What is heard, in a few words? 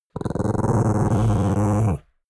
chihuahua growl; natur-tiere; hunde